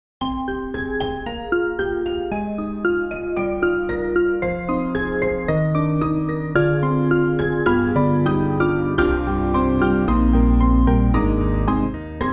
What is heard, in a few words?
Upright Octave Stereo; Spectrasonics Keyscape; Wing Upright